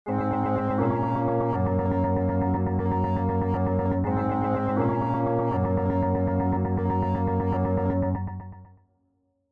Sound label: Signal Tape Loop Expansion; Halfway There